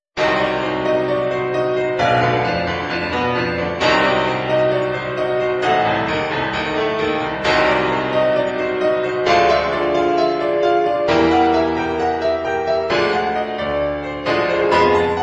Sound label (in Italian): Suite Per Sax Alto, MIDI